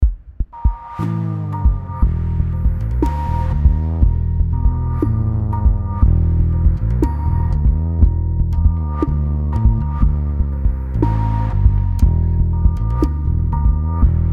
unprocessed bypass; LowStructures

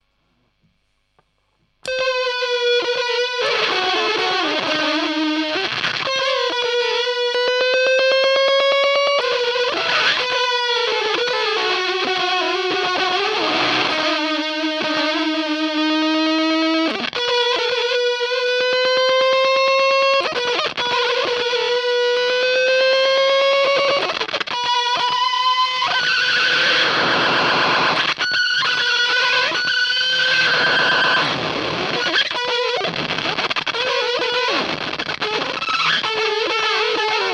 lead fuzz; superweirdo

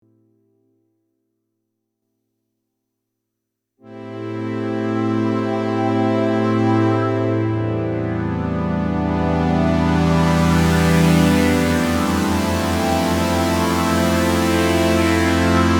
DM12D; Teppichleger

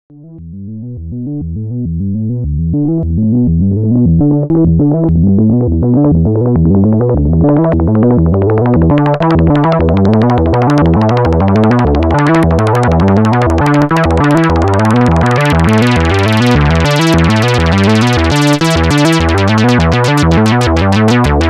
LFO ENV retrigger

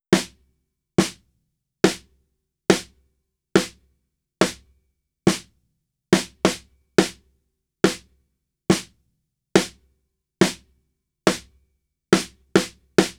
Snare Without Comp

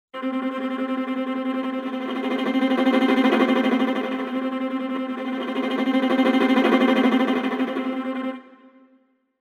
Emotional Viola Tremolo Soft 2Lay To Tremolo Fast

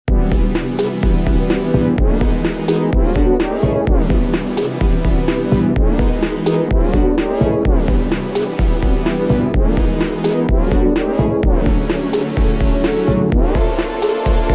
Sequential Prophet X

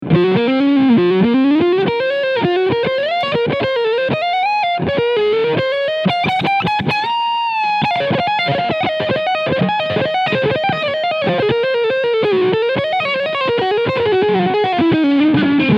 Lead Sound Neck Pickup; Harley Benton SC Custom II Active White

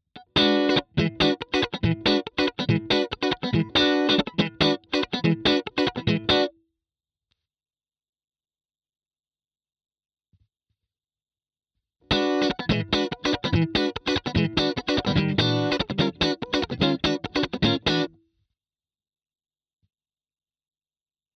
Funk; ATLAS Comp Dry Studio Optical Dual

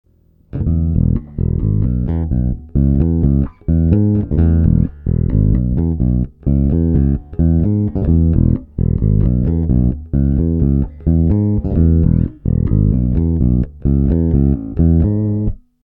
bass boost j pickup

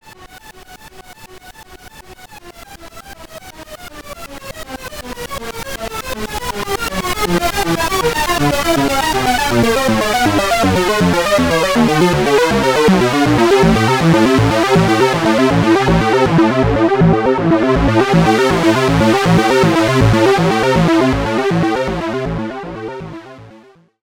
Oberheim OB-X Morphing; GForce

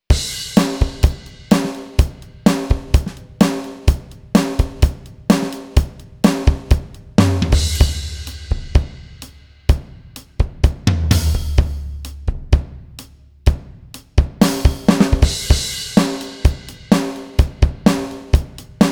Pop div. Grooves; Harley Benton Dual Effect Double Jammer

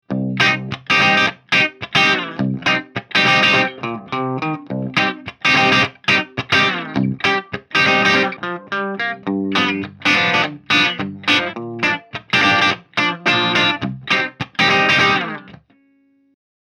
Peavey 6505 Clean

